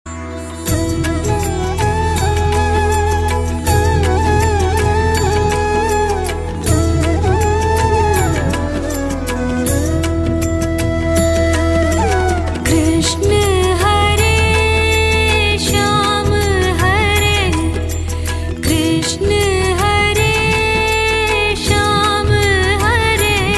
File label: krishna hare female